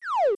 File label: falls out of pipe; lemmy wendy; smw